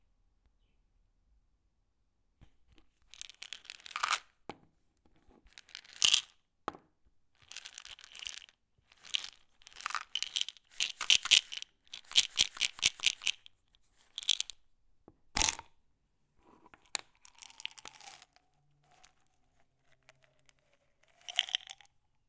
Pill Container

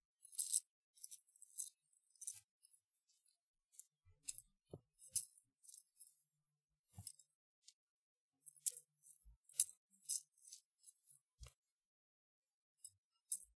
Necklace

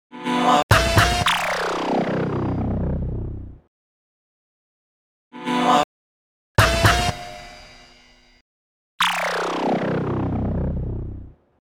Radio Jingle; Radio Macarena

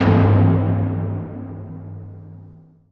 Cinematic Percussion

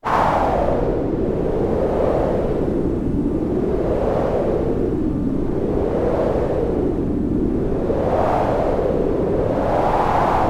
Synth wind

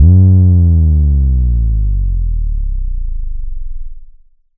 Bass Drop

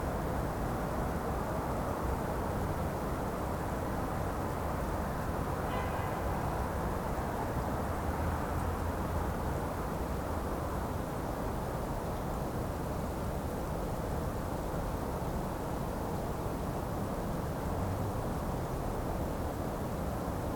Nightcity Hum

